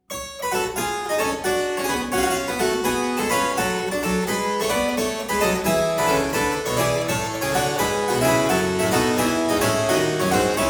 Suite in G minor Gigue, Harpsichord; Buxtehude; Complete Harpsichord Music

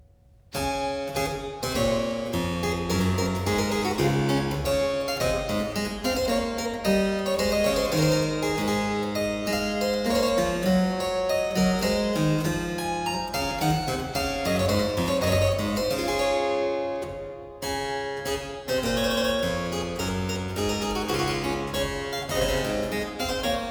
Gavotte, harpsichord; Almond in D-sol-re#, ZD. 219 No. 1; Complete Chamber Music; Brilliant Classics; Miscellaneous pieces for the harpsichord

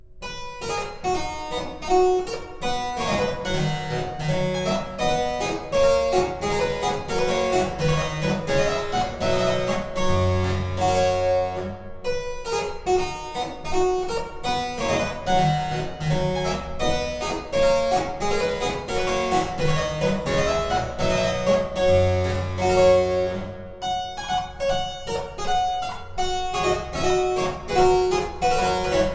Suite In C Major, Jigg In C, Harpsichord Suites; Suite No.5; From Musick's Hand-Maid; Purcell edition

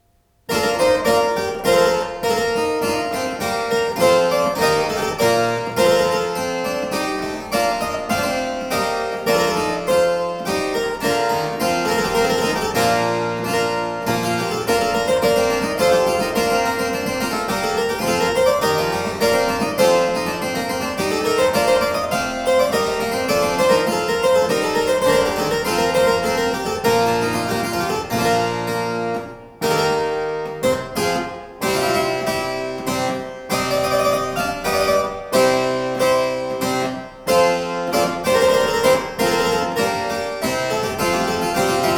The galliarde (harpsichord); Byrd

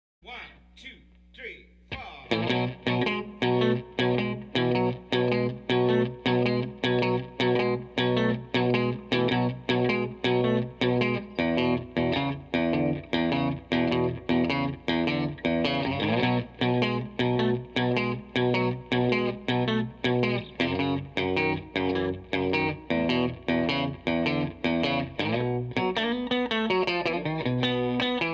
B March, harmonica; Blues Harmonica Masterclass

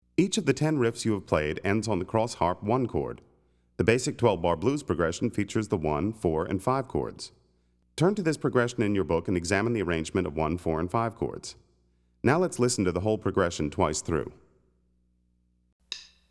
Cross-Harp Blues Progression, harmonica; Play Harmonica

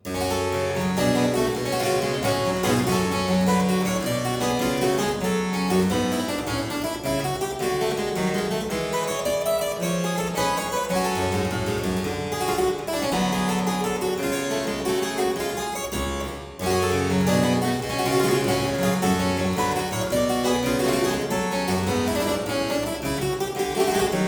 Suite in G minor Gigue, Harpsichord; Buxtehude; Complete Harpsichord Music

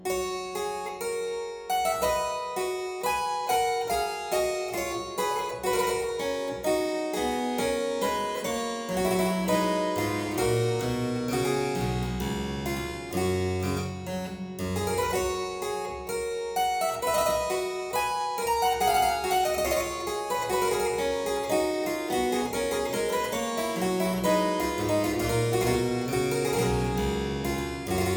Aria in G major Partita 23, Harpsichord

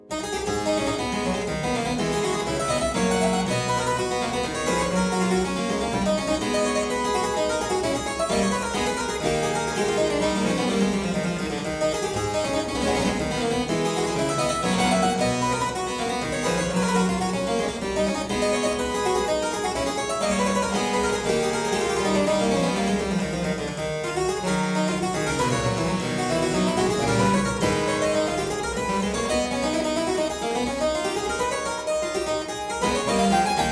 Courante in A minor - Variatio, harpsichord; Buxtehude; Complete Harpsichord Music; Courante zimble; BuxWV245

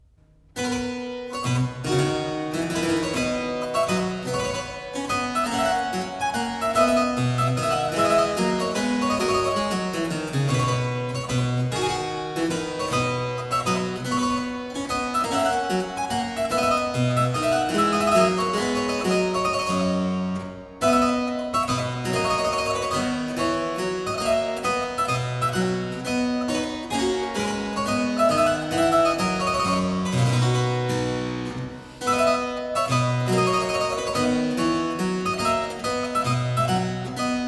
Trumpet tune, harpsichord; Complete Chamber Music; Brilliant Classics; Miscellaneous pieces for the harpsichord

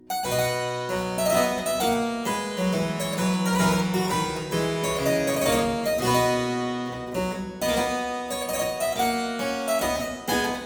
Suite in C major - Courante, harpsichord; Buxtehude; Complete Harpsichord Music

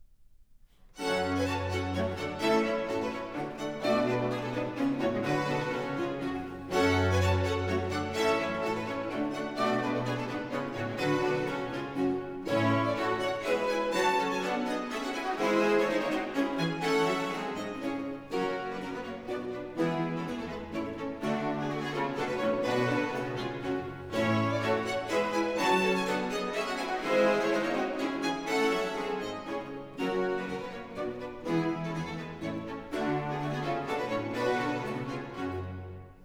Hornpipe; Baroque; Purcell; Highlights Opern

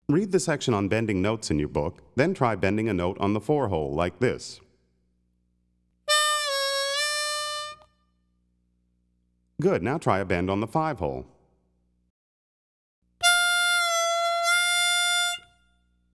Bending Notes, harmonica; Play Harmonica

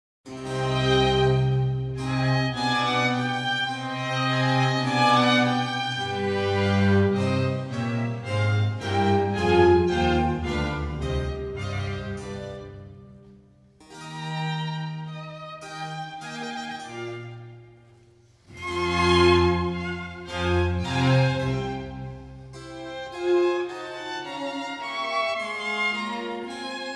Concerto Grosso in C minor Adagio; Geminiani; Op. 2, No. 2; Italian Concerti Grossi

